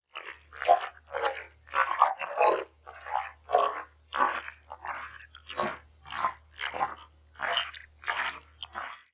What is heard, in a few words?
move slow; svencoop; kingpin